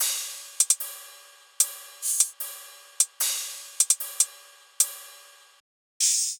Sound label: Drum Loop 150 Top; Ghosthack; Color Bass Essentials